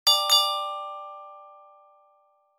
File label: glockenspiel negative ui alert